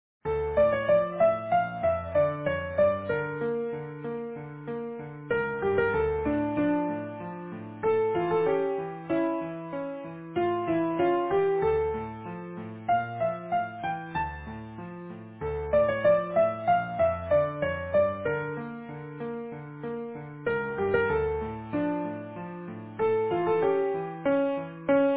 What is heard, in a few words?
Easy Piano